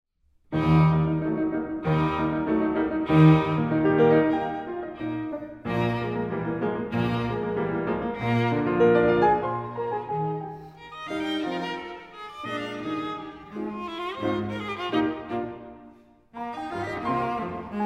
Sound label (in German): Klavier Trio